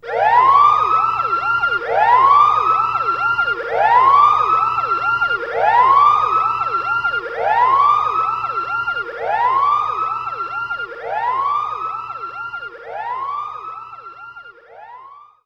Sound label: Lab Alarm; Shadow Pokémon Lab Alarm